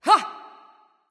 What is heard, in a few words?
grunt jump; smite; voicepack